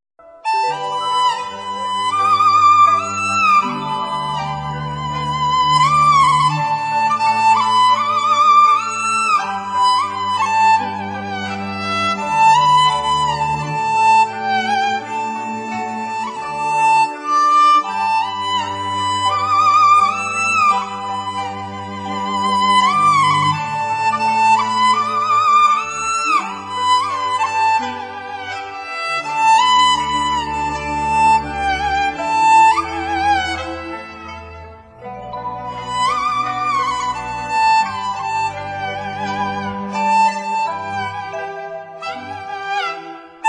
banhu, chinese

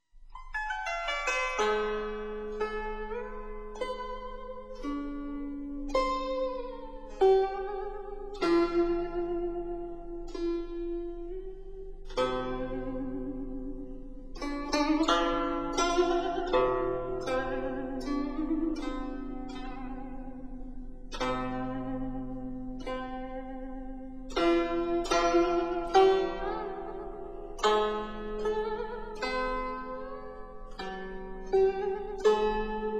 zheng, chinese

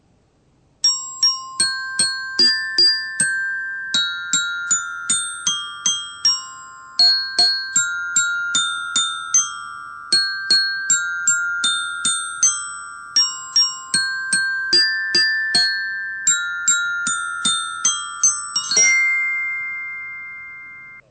glockenspiel; western

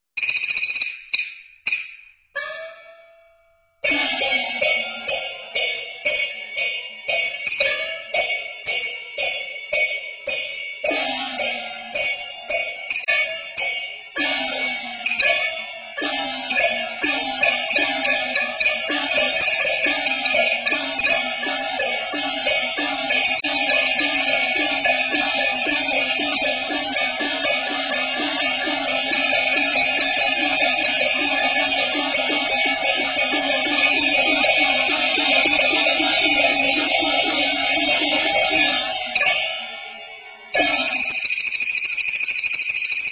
gongs; chinese

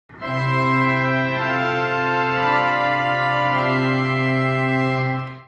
2 strings; organ music

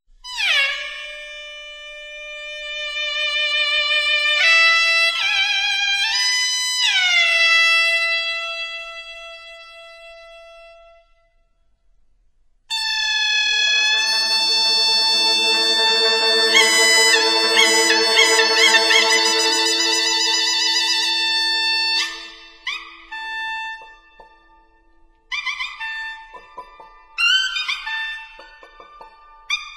suona; chinese

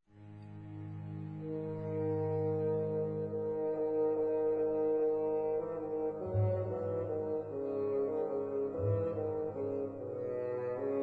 saxophone; western

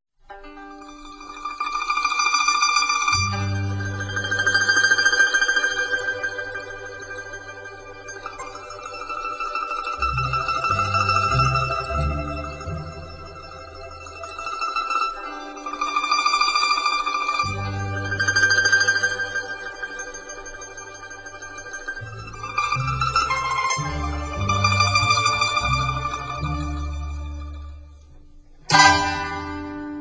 liuyeqin; chinese

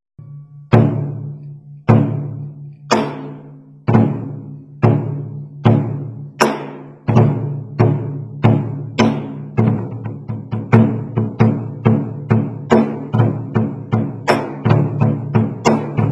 flower drum, chinese

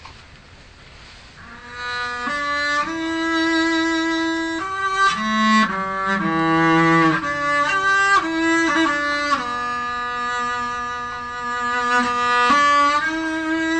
gehu; chinese

